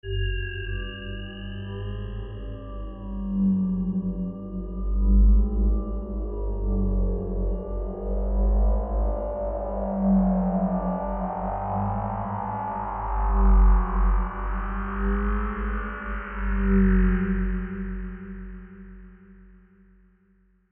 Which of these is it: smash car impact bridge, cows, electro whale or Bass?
electro whale